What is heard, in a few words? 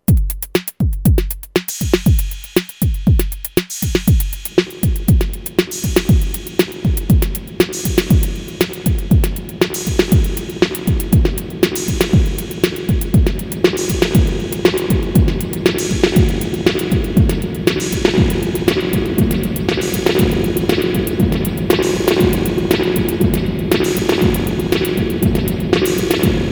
reverb